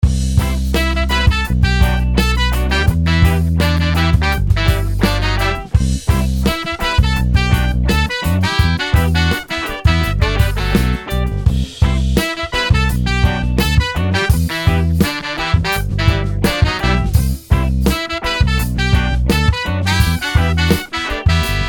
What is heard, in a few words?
Funk Soul Ensemble